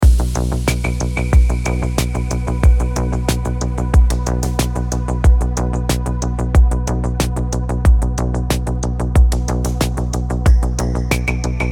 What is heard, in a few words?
menu loop